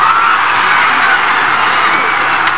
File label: CROWD WIN; PEOPLE